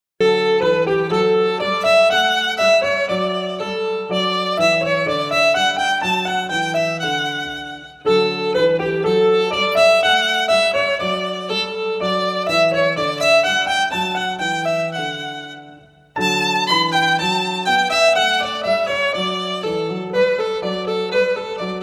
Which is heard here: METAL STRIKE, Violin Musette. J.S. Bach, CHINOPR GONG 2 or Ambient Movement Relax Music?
Violin Musette. J.S. Bach